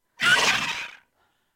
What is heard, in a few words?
Animal Call Cockatrice